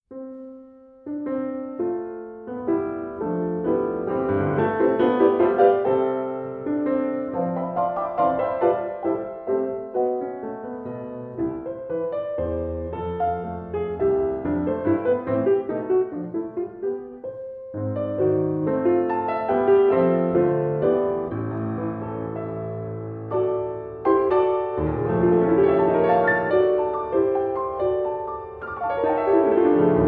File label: Variations for Piano Andantino